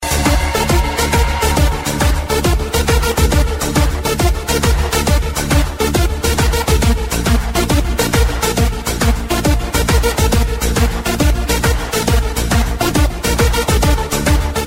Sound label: Club Ritm